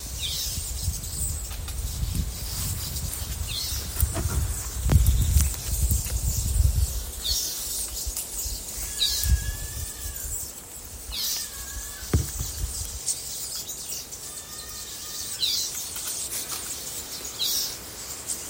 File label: black whiskered vireo call; birding by ear; Bonaire